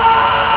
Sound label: car horn